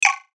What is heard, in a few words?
CLICK DIGITAL RATTLE; Clicks; Blastwave